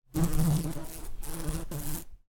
insect fly buzz around close up

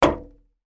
household metal radiator knock; sound effects one

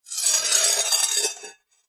warfare knife blade slow scrape metal

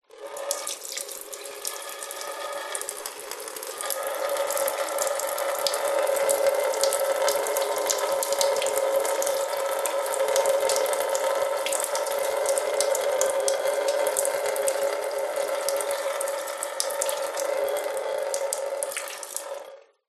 human peeing into eco hybrid toilet metal flap

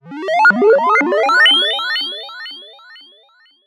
ascending delayed beeps

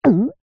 cartoon gulp swallow nervous

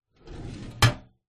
office metal drawer open; zapsplat

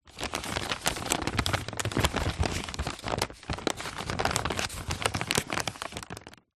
foley paper heavily painted movement

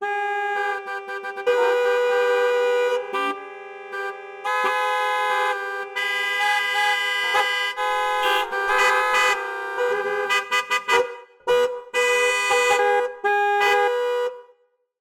Traffic Jam Horn Honks; Blastwave FX

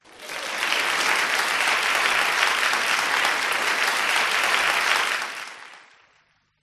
HUMAN CROWD Applause Short; smartsound

